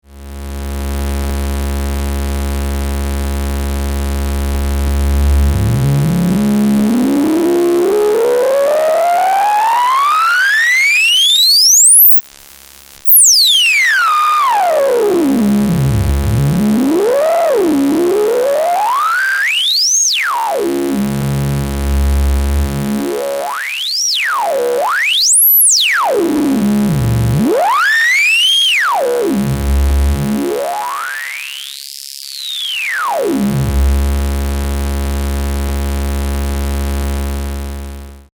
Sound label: a123 high pass, A-123 24dB High Pass (VCF4); A-111矩形波を使用したサウンド変化; DOEPFER A-123